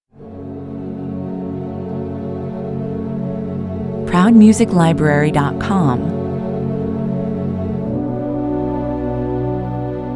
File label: strings only